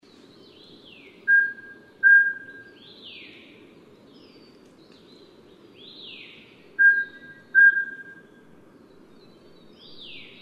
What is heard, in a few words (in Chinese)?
黃嘴角鴞; 鴟鴞科/留鳥